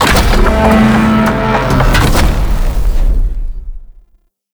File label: Void Dragon Ring Turn Start; Enemies; Gameplay; Warframe; Duviri